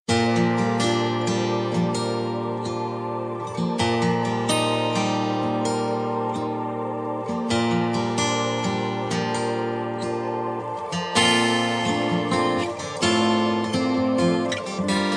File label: guitar